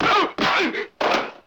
Kung Fu Movie Punch

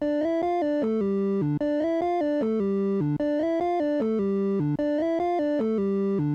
Moog Triangle Loop

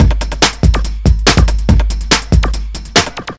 Ghetto Techno Beat

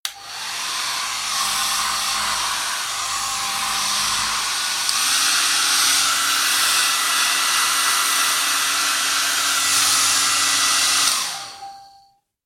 Hair Dryer Sound

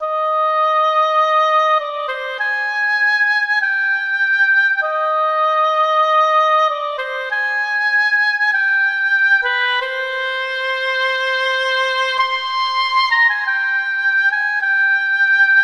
Oboe Solo

